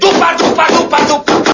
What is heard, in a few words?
death sound